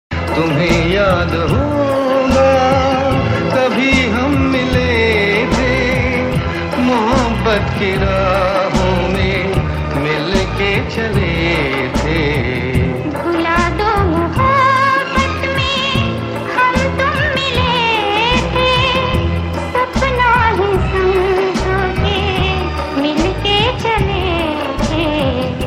Hindi old Sad Ringtones; Hindi Movie Ringtones

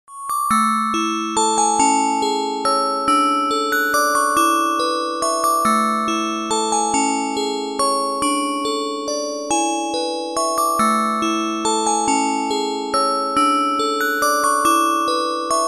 Alarm Tunes; Ringtones

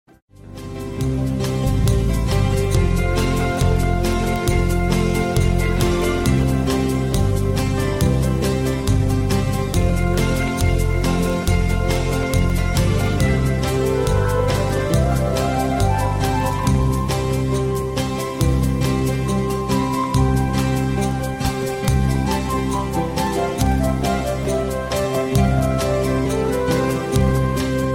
Valentine Day Beats Ringtone

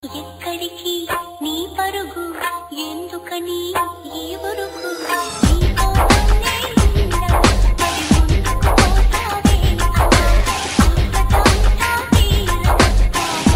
old telugu dj shiva ringtone; Navratri Special

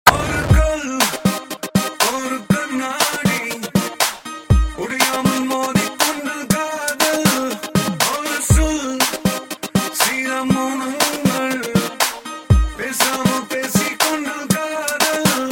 sms shiva ringtone; Navratri Special

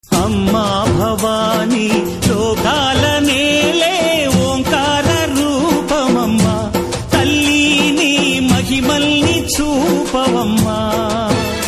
Devotional Ringtones; Navratri Special Durga Mata Ringtones

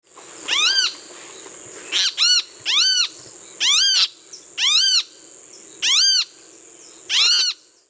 The sounds of birds

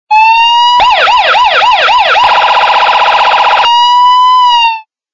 siren, Sound Effects Ringtones

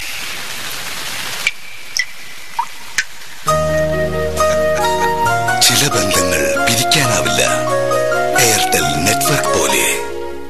Water Sms; Airtel

